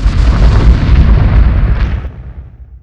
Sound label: nuke; SuperPower 2 Redux V7A